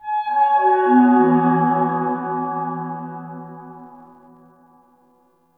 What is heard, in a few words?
Cascading Fifths Flower Structure; Tau; Flower Farm